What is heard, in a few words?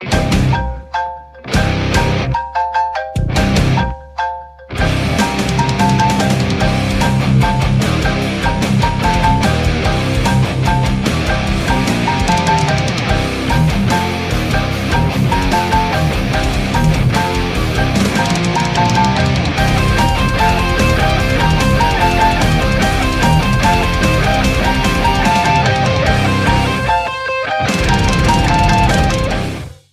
xylophone heavy metal